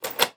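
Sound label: Hospital Room Interior; Medical; Blastwave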